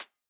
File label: hats C, hip-hop drum kit